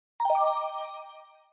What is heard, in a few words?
Fold close, Nokia N76